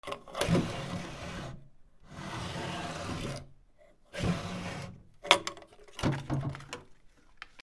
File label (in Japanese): 引き戸, 『シュー』; K｜フォーリー(開閉); K05 ｜ドア(扉)